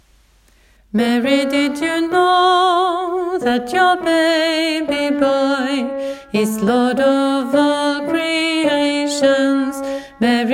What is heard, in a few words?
mary tenor; åfjord